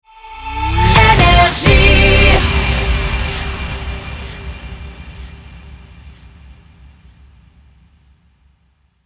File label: Jingle Rapid Fire; NRJ